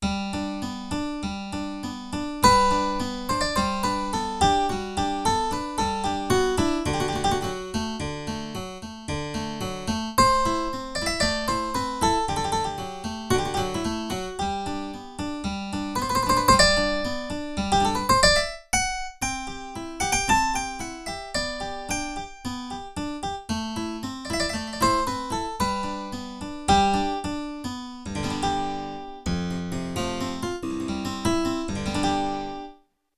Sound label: Harpsichord